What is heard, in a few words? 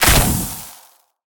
crit; smite; olorun